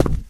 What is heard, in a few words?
plaster, footsteps survivor walk; Left 4 Dead 2